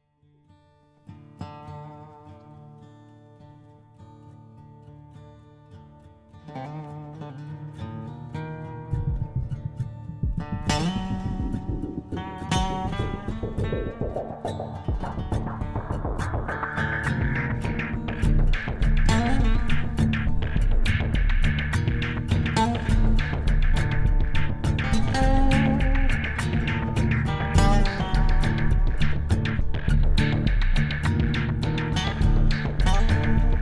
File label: Loading; Project Reality BF2 1.6